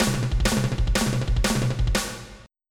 fill

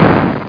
firework; amiga; DiamChall

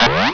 quit

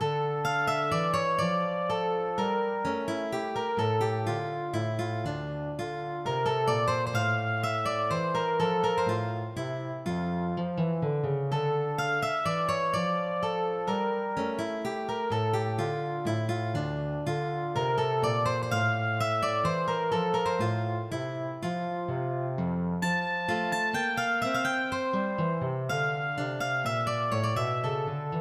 minuet

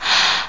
breathin; music samples

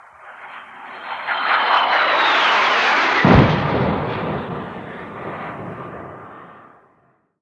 planet take off; Earth and Beyond; EA Games

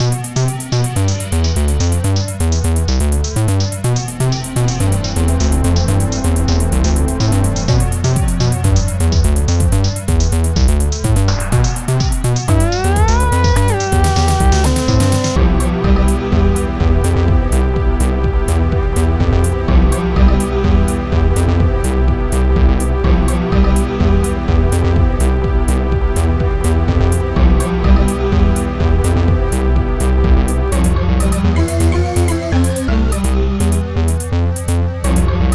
imagination music; screenplay; blockblank

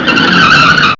skid

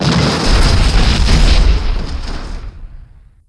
Building blast; ImpGal2; groundwar

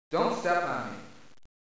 STEP

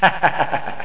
win game; Hang2000 v1.1

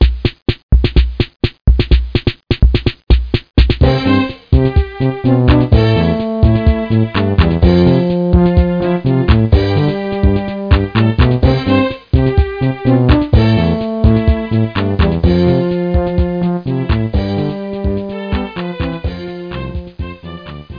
guide Book March

